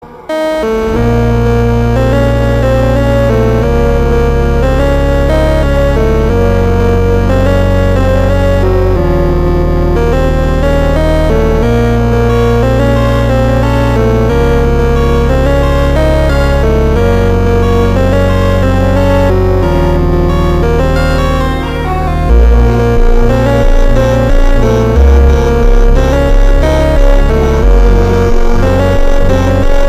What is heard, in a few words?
Slowed Ringtone